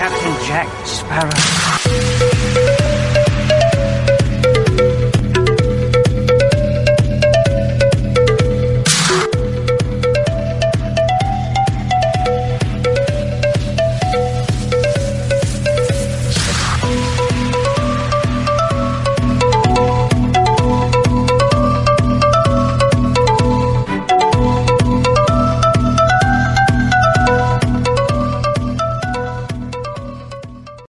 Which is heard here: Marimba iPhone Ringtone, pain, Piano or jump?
Marimba iPhone Ringtone